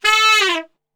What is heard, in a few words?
A 2 SAX SHF, Sax; Giga Samples Collection